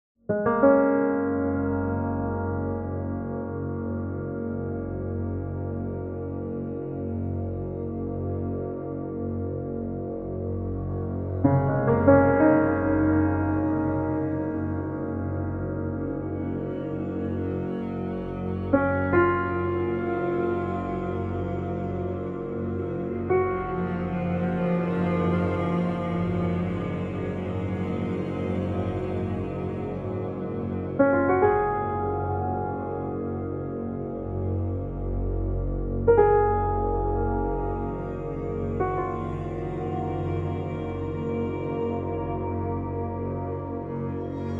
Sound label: Piano